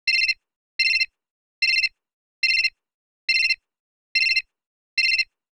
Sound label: ringing